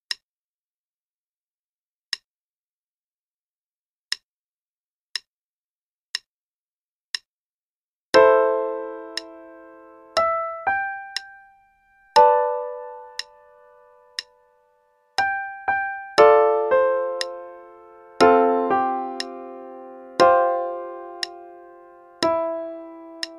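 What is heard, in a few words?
RH only, qn=60, piano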